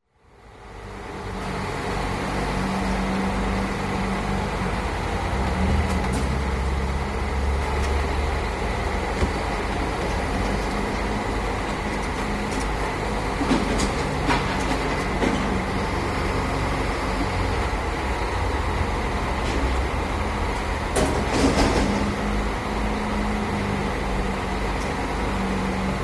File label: emptying glass bin; fieldrecs